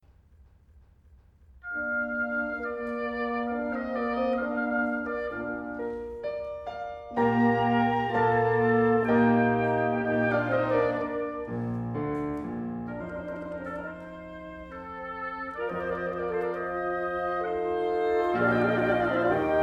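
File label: Piano Quintet Em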